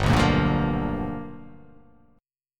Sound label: G 7th Sharp 9th G chord